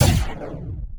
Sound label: plasma impact